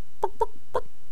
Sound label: chicken standing; megaglest